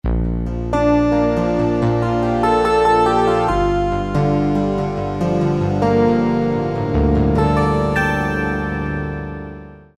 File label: Steel, Yamaha TG33; Factory Presets; Preset bank 1